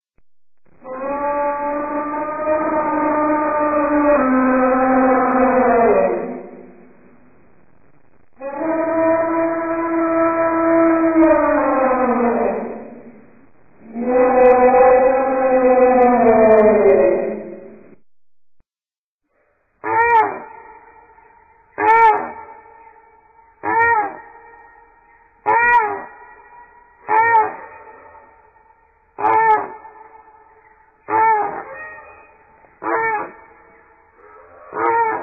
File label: dino sound effects